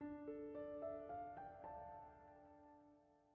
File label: Piano; Frozen Flame; windows